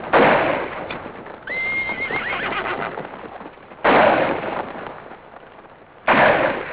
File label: noise